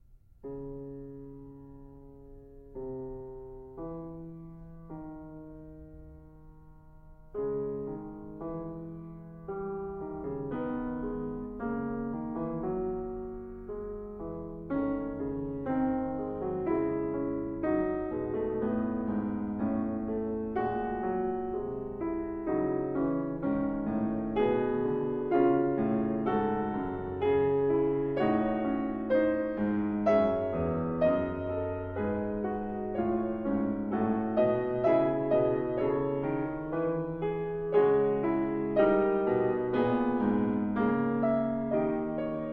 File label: Prelude and Fugue C Sharp Minor; BWV 849 Fugue